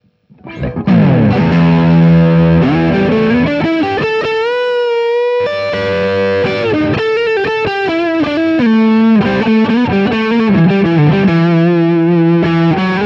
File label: Gretsch ETMC LTD Pristine JET Lead